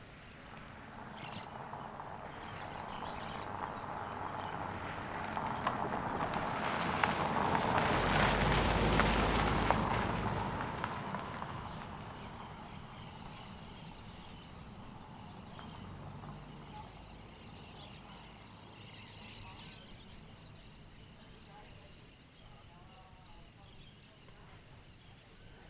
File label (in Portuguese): Carro passando com revoada de periquitos; aves; canais surround 5 1; Coleção Sonora do Cerrado